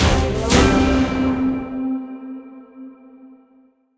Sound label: win Medium; jumanji